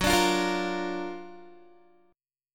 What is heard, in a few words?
Gb Augmented Major 7th Gb down Guitar 4E,4A,5D,5G,5C,6F; Listen to Gb+M7 strummed